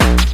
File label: bombo, kicks, Hardcore